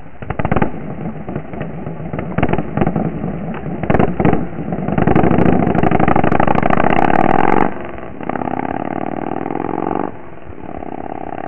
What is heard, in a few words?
start, harley chopper